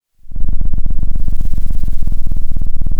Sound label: pop, Sound of a cat purring